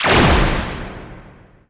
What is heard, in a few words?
Enemy Bossegg Divide; disney star smash android ios mobile gamerip 2020